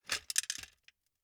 Gumball machine, metal, glass, candy, switch, mechanism, rotate, dropping, gritty, hyper; Eiravaein Works; Vaeyan IV